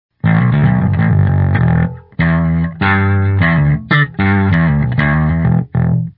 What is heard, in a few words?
bass distortion; fingers